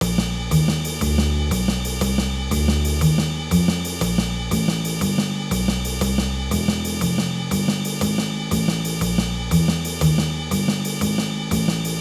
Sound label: Jazz Basics